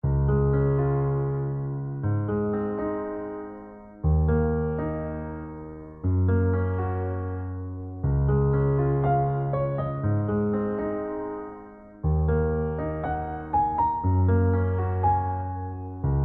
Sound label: Piano Only